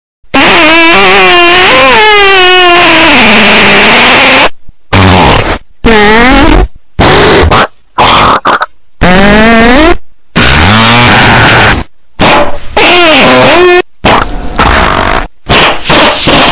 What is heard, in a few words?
big fart earrape